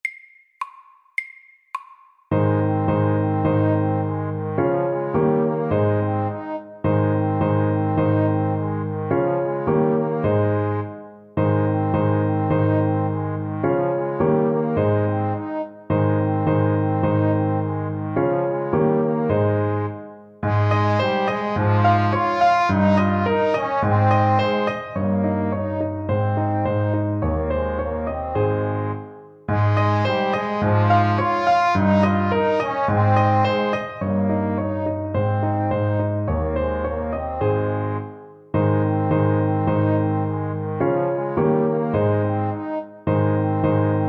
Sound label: Ecossaise MEDIUM 106